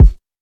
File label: kickdrum a sharp key